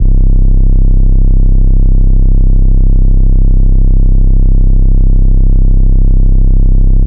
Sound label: Bass Shot C; DUALITY; Pennsylvania